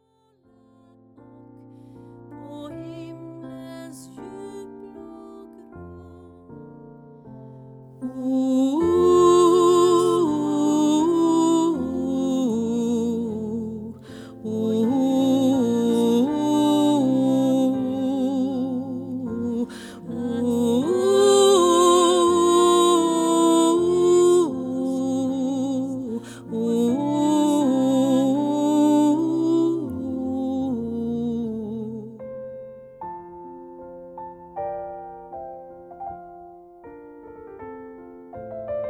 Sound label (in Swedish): Nu tändas alt